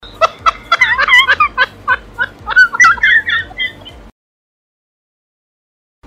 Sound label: laughing; cupcake